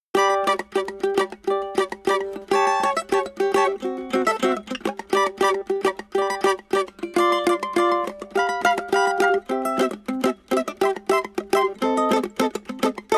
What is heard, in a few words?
cavac bandola; Cavaquinho Bandola; Un cavaquinho Harphia